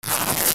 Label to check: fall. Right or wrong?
Wrong